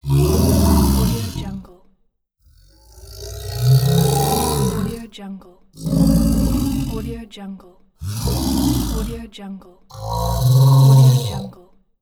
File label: angry creature growls